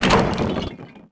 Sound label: impact crate varied; impacts explos